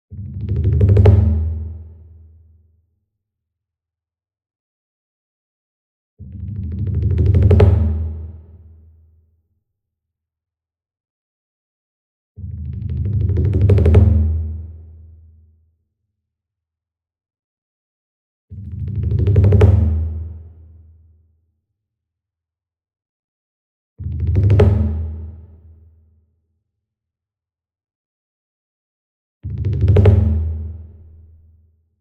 SINGLE DRUM Kodo Roll Soft Beater Medium, Cinematic Strikes; boom library; Cinematic Strikes Construction Kit